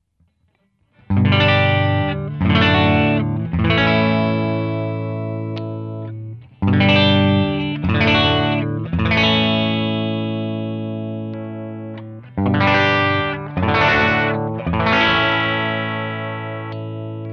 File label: gibson lp special faded